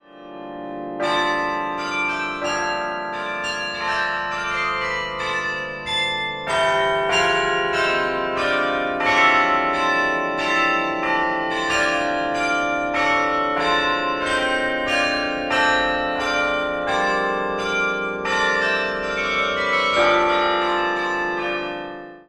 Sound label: Carillon